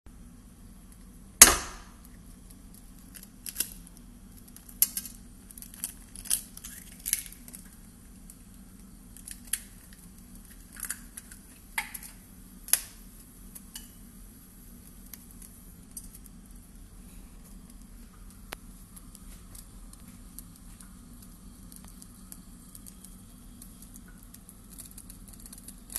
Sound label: kitchen